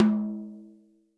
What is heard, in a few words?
tom, Real Drum Kit, funk kit